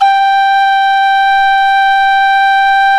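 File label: PENNY WHISTLE, Exotic Winds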